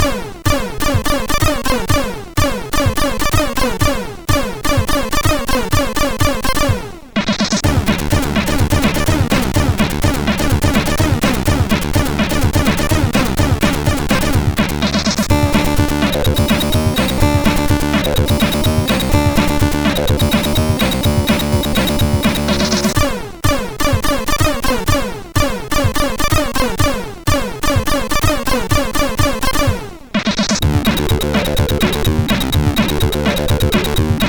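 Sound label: Easy Techno, MOS 8580; CSG8580R5; hvsc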